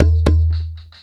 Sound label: PICK PERC; USB Soundscan vol.32; Roots Disco; AKAI